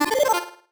marimba dice; ゆめ2っき

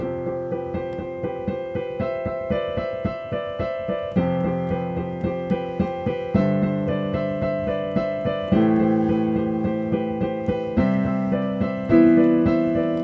Piano; ゆめ2っき

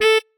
marimba bat

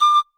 marimba child; ゆめ2っき

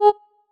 marimba oo choir

